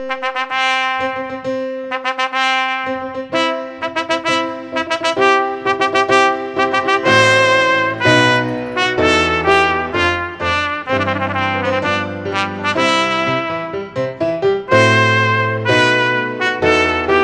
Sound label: Wedding March